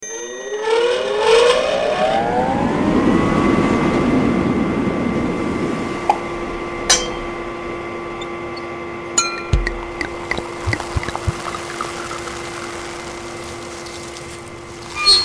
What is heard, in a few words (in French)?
jeu bruitage; Eurosphere